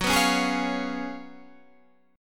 F sharp Major 7th Suspended 2nd Suspended 4th, Guitar, Standard; F#M7sus2sus4 strummed